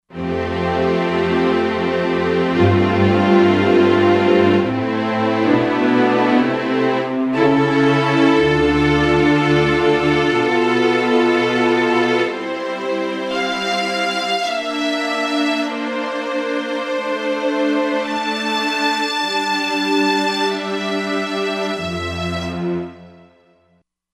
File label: Full Strings